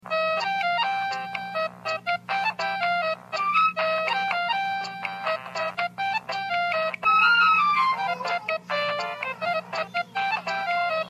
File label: nokie ringtone; soundboard